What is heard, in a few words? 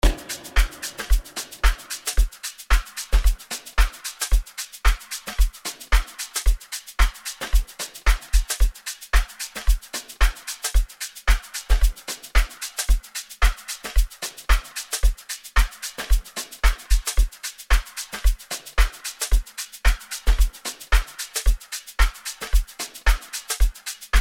Afro Piano